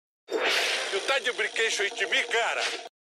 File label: lalala